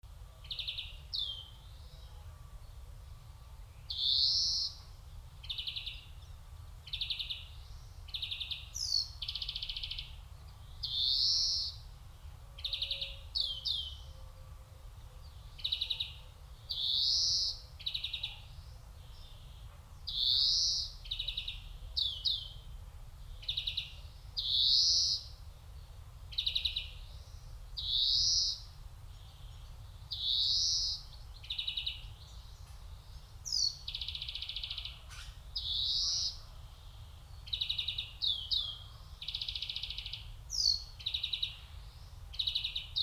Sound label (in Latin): Chloris chloris; SETE